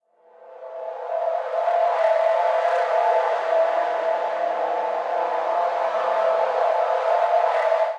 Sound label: Drone; Pioneer Drone